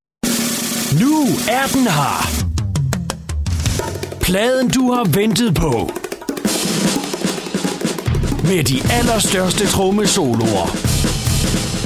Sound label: Drums